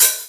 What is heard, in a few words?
hihat; osu